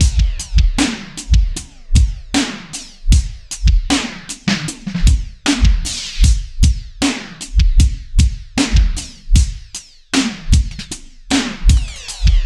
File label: Warble B